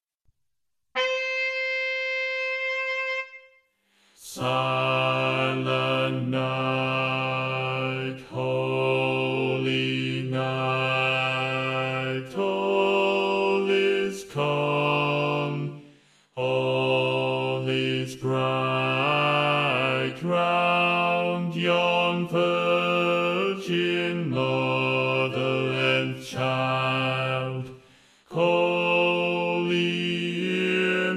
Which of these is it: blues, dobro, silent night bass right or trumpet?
silent night bass right